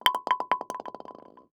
Sports Bowling Pin Fall On Cement Floor

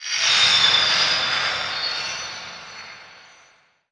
Title Transition Glass Element; Adobe; Production Element

